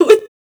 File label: FEMALE, Dance Vocals